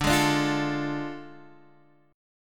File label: A Major 7th Suspended 4th Sharp 5th D